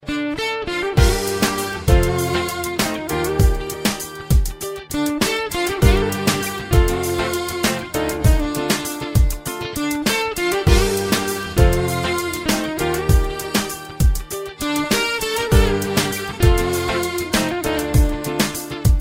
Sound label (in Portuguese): Música Árabe Música Romântica